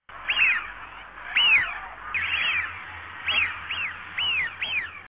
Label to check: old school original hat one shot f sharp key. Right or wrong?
Wrong